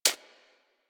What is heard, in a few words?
Bird Of Prey Feed Ground; Characters; Warframe